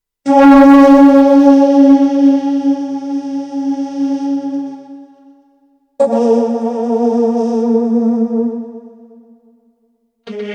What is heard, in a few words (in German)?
Der Vocoder